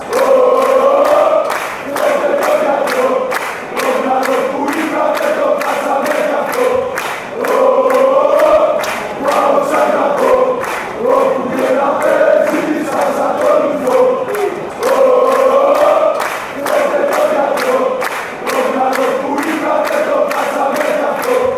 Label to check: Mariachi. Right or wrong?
Wrong